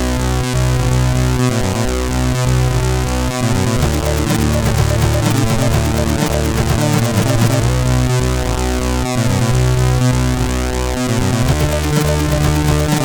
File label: Game music, sid CSG8580R5